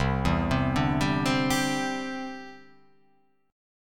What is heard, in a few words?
C-Major Add 9th